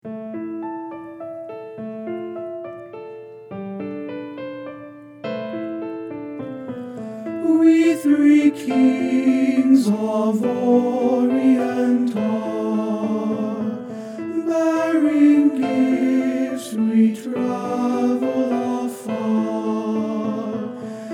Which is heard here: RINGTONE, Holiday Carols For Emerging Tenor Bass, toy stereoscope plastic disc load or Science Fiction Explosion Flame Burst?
Holiday Carols For Emerging Tenor Bass